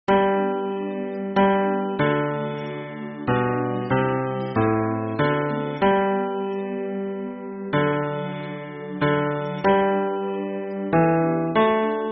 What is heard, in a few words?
hymn bass